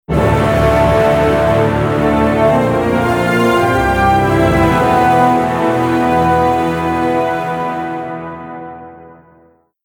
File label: music victory short; REM-Freelancer; REM-Mod